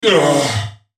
dying; Jayce Sentinel

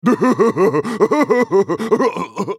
Maokai laugh

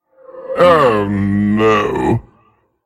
dying; dandychogath; Character Voices - English

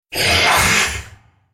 Droid spellcast effort

